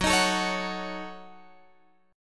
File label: F sharp Minor 6th, Guitar Drop D; chordshape; down